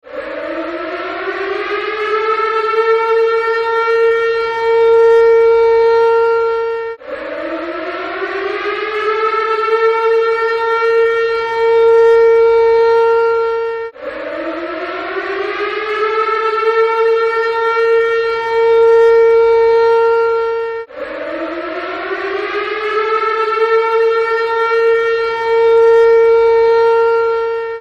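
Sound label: Siren, school bell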